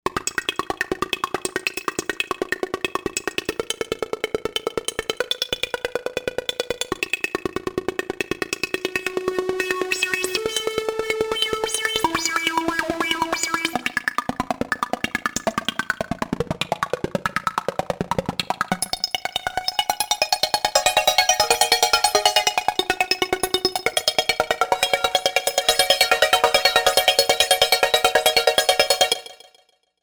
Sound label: Filt Seq LFO; Novation UltraNova; Factory Patches